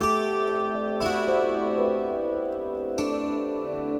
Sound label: Kantele Gong; Acoustic String; Sonic Foundry (Sony Creative Software); Friendly Fire